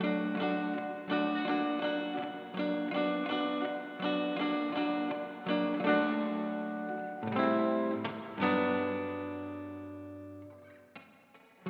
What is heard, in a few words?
Soul Guitar, 70s Analog Funk; Sonic Foundry (Sony Creative Software); What it Is!